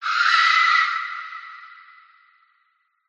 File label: scream shriek x2 descend ascend sound design reverb; Skyclad Sound